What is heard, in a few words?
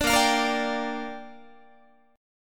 A sharp 6th, guitar chord; A#6; strummed